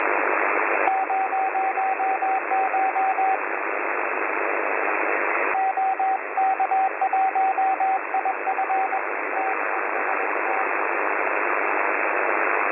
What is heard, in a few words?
CW versus SSB; truSDX